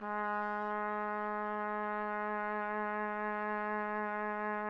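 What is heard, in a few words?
TPT, trumpet; Roland - LA Composer vol. 3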